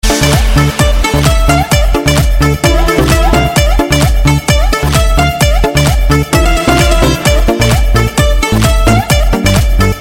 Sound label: Club Remix